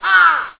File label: Crow; ゆめ2っき